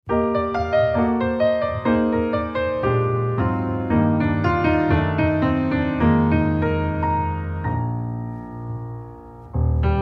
swing

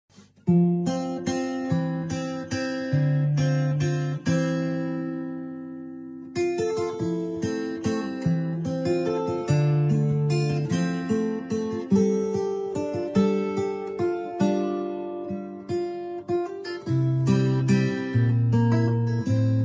guitarist; midlands

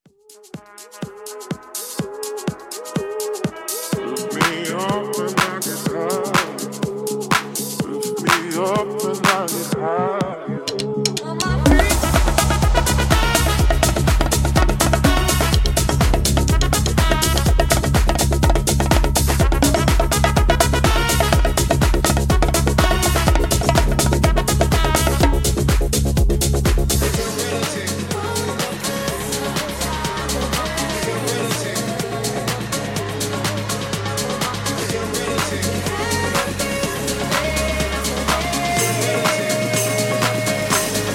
dj percussion; soundtrack social

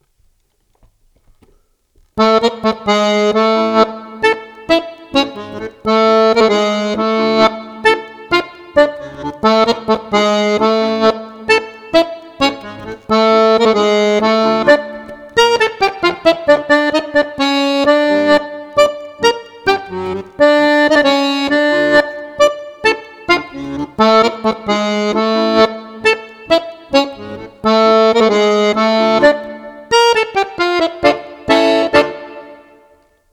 Tango

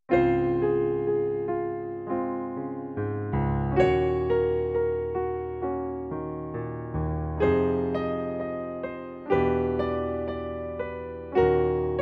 pianist; worchestershire

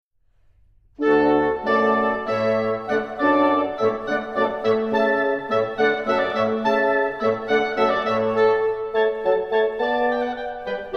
Hornpipe

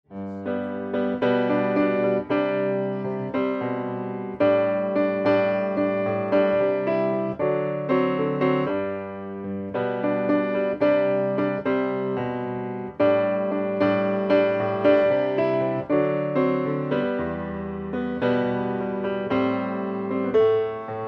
pianist; leicestershire

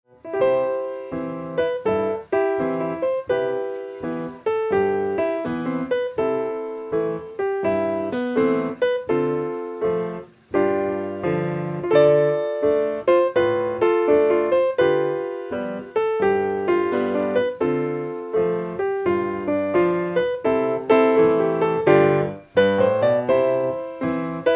pianist; leicestershire

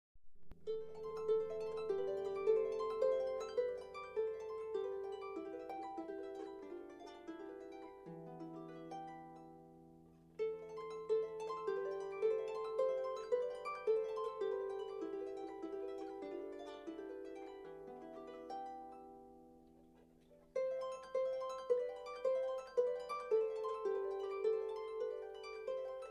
Breton variations

